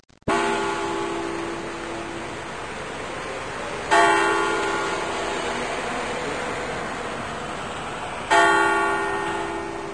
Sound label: Crimson chime installation in Spasskaya Tower of Kazan Citadel; Prometheus (1967)